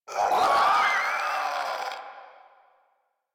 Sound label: Infested Crawler Death; Warframe